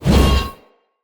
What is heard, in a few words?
Whoosh, Swords; Mockery Sub Boss Stultion; Enemy; Weapons